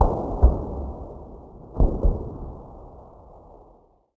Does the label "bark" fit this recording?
No